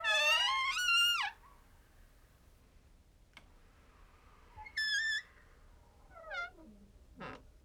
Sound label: Squeaky Door Slow